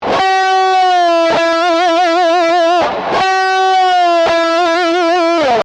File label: Prebend, Guitar Solo